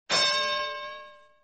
pang

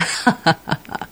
laugh; freak fortress 2; femspy

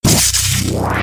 drop bomb, samus powersuit

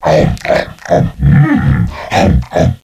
eat; bowser

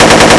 fire weapon; bioclone

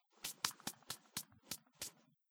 dusting off hands; fortnite emotes

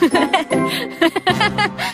laugh; luna; mlp

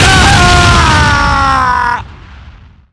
explode; freak fortress 2; humanbuster

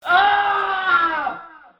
scream

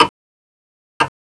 clock tick

